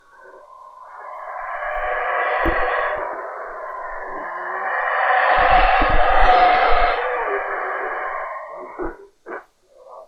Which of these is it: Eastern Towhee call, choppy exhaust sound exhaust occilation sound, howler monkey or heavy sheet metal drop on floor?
howler monkey